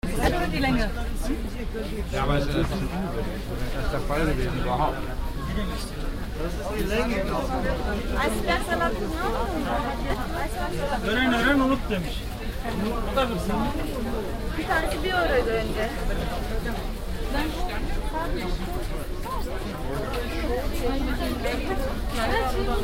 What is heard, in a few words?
turkish market; germany berlin